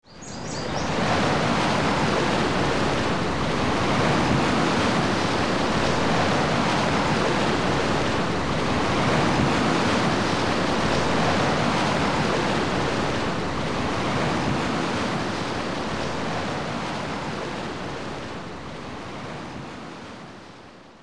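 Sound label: ambience hitchhike; Wario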